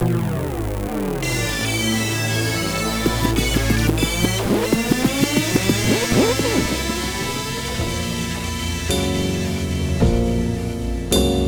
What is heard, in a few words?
jingle